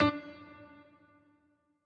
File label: piano riff intro